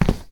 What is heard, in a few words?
Tile long; devour 2021 gamerip pc macos